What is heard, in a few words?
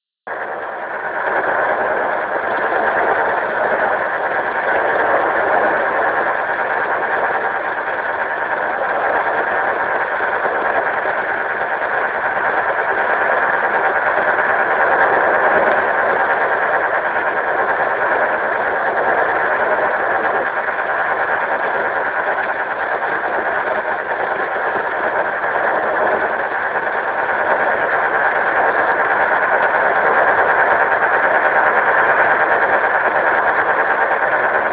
am recording shortwave